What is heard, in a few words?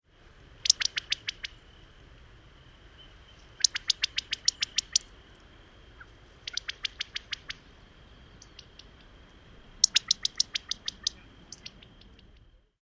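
California Quail alarm; bird language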